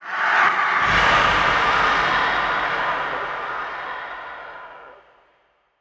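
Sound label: cave rear right, antlion distant scream; creatures; Model Pack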